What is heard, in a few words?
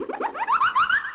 Magic